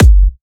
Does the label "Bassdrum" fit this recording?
Yes